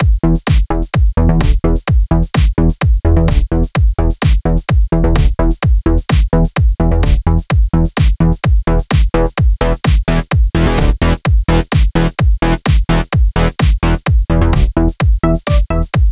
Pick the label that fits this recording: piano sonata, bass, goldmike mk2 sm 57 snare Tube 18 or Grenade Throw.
bass